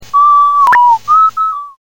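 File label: bird song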